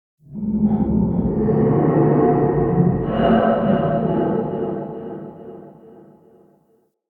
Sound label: Eerie Texture, Ambience; Red Night